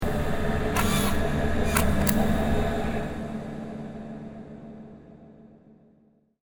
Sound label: Spaceship Repair Dock, science fiction sound effect; Andrew Fleming